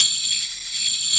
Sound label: WS TAMBRN, DRUMS; Analogon